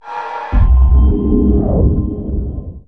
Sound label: swell mana; battlecastle